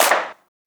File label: Monster Clap